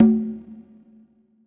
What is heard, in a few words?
Echo Conga; Sonic Foundry (Sony Creative Software); Joe Vitale Latin Percussion